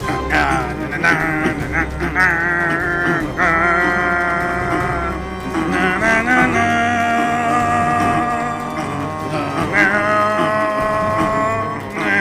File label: Music